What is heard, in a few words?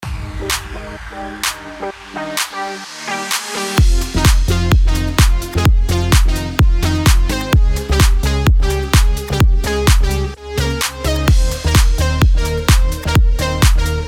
BED EDM FMIN 128BPM